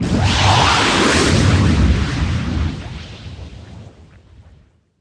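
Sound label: combat explode tie interceptor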